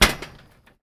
metal grate, player footsteps

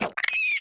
headcrab grumble; scripted sequences; hideout

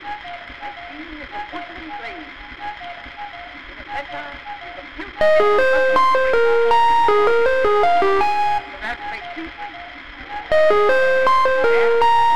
outside forest high; ambient; Counter Strike Model Pack; CS:GO; survival

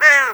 alert, crow; Half Life Model Pack; Half Life 2

